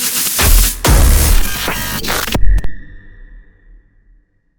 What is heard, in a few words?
digital impact hit